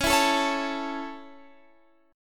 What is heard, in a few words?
Db Minor Sharp 5th, Guitar, Standard; strummed; x,16,14,14,14,17